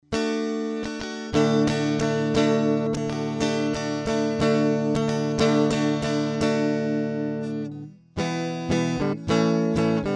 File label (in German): Focusrite Scarlett 2i2 Gitarre